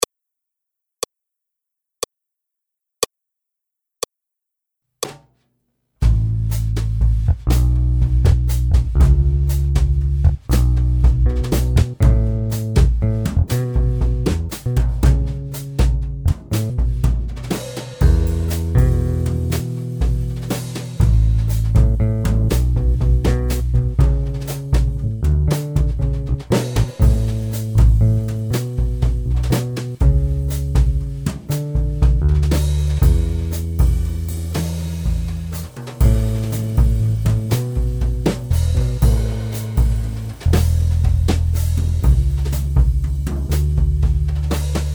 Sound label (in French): Accompagnement seul